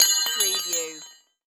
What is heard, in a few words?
Metal Object Drop